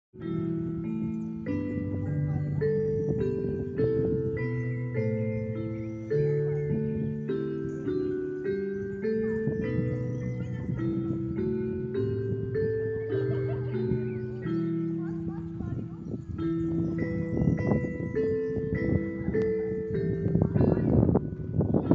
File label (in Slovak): Zvonkohra; Rajecka Lesna; historickej techniky